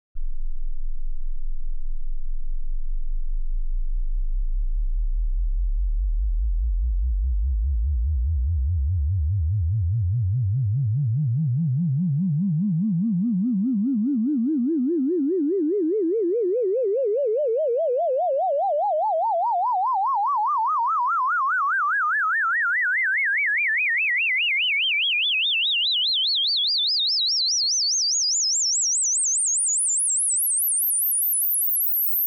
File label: 20 hz to 20 khz continuous warble; Скользящий модулированный синус 20 Гц - 20 кГц.; Tестовые сигналы и звуки.